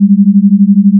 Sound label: beat am